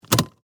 Suitcase Latch, Foley, Suitcase, Latch, Open, Hard, Shell; Latches